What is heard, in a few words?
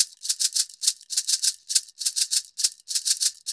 Cabasa, Festa Latina, Drums & Percussion; Best Service; Festa Latina VOL-1